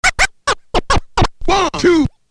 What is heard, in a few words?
Scratch; Sonic Foundry (Sony Creative Software); Hip-Hop Street Beats Construction Kit; MAC MONEY